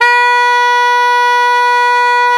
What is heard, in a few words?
SAX B2, SAXOPHONE; USB Soundscan vol.63; Balkanish Traditions; AKAI